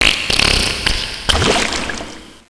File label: fart; headz